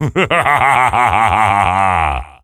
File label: Laughter